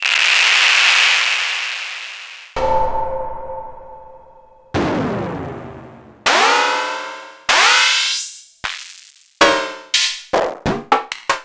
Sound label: Hat c